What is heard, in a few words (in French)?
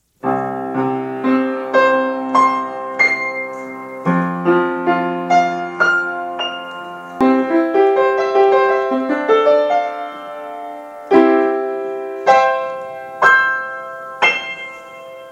Piano un peu faux